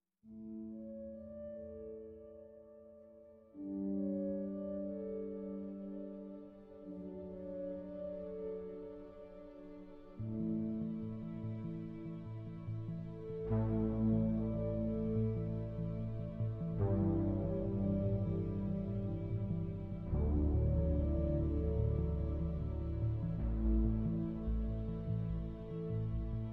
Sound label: POST PIANO